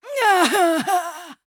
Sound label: Female Night Elf Death; Hearthstone; датамайн обновления 5.0